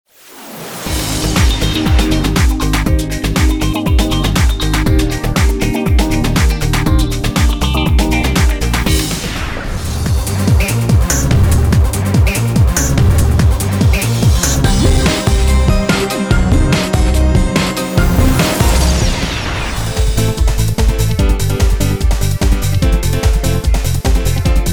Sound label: dance